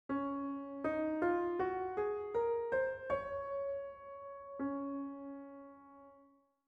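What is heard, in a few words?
Db major scale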